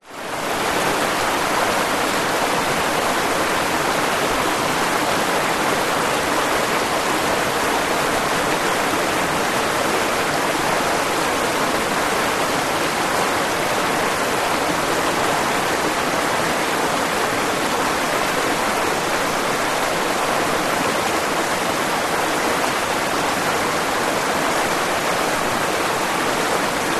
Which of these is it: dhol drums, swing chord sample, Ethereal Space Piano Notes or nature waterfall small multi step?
nature waterfall small multi step